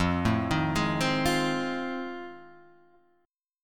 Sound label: F 7th Sharp 9th F